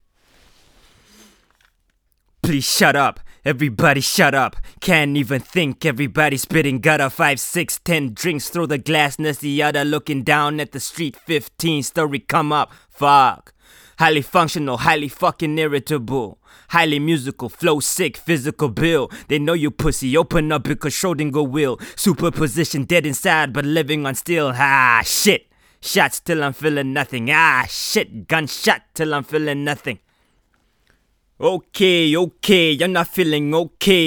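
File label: hip hop 179 Em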